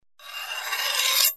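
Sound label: sword blade sharpening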